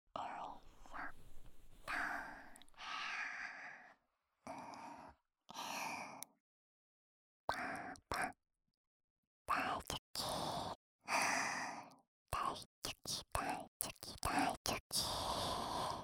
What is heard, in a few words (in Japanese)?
耳なめ（左耳）, ASMR; 踊り子ちゃん